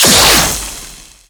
poison projectile

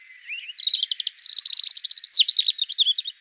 bird, ambient animal; Counter Strike Model Pack; CS:S

